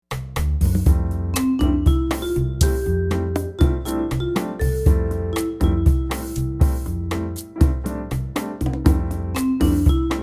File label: Bossa Muzak; Reuters TV Underscores